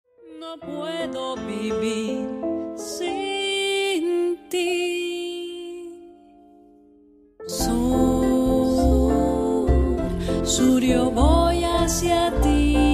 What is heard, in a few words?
tango song commercial native spanish south america